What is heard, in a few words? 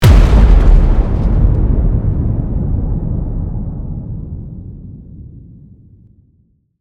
explosion